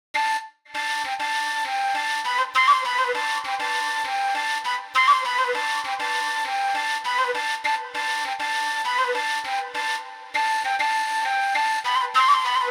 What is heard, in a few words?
flute